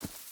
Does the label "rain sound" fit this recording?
No